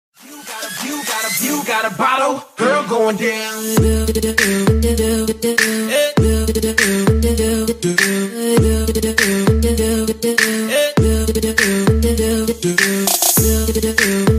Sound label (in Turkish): Telefon Zil Sesi